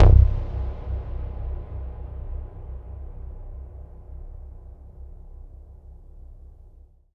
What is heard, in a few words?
BASS Triggers FX; Polyend; Plughugger